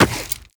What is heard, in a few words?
Run Rock; Footsteps; Warframe; Tenno